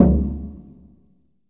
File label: FX SURDO; AKAI S-Series CD-ROM Sound Library VOL-5; FX PERC